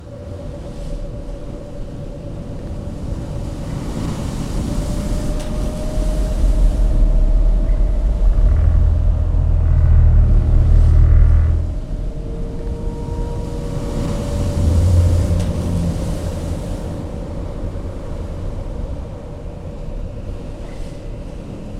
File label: Pirate Ship Ambiance